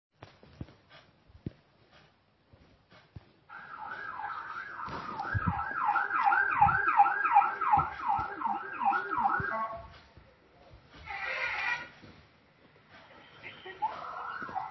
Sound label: ambulance and bus